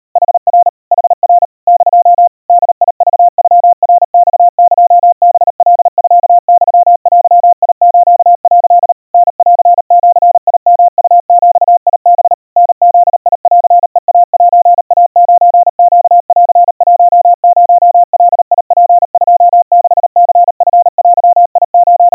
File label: kamyu 140 cpm 700 Hz Crispy, Morse